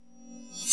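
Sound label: Riser Beam Sharp Reverse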